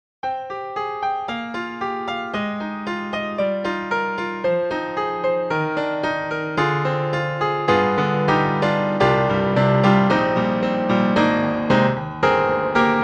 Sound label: D-PRO, Mini Piano (dry); Cakewalk